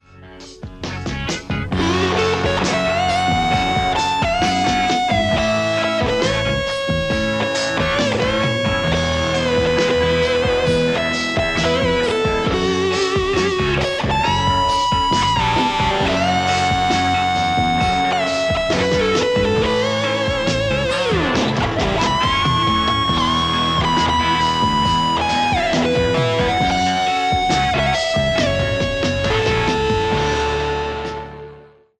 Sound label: les paul; kuassa vermilion